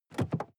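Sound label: sports car interior seat handling medium; verkehr transport; autos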